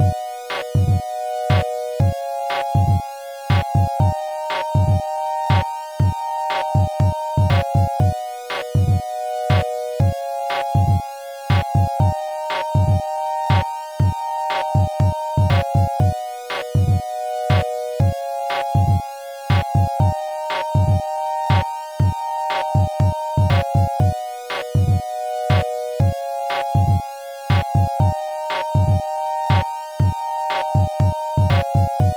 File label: Sine Wave Project